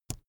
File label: keyboard laptop space push soft